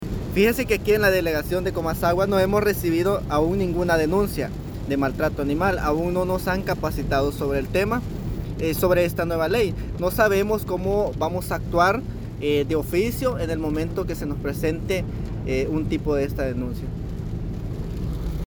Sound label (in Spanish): fuente policia